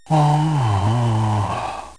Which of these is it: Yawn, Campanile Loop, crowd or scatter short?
Yawn